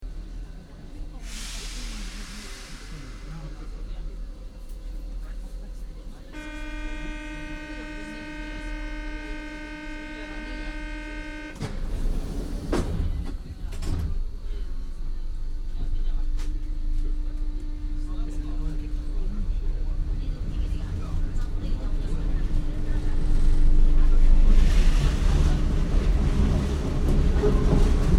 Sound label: Europe France Paris City Metro Internal Train Movements Commuter Walla Passanger Movement